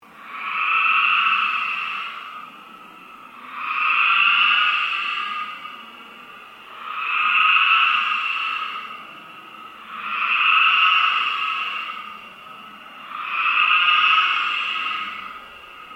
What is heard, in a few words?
Ambient Drone Slow Sweep; Sound effects created by manipulating other sounds; Sound Design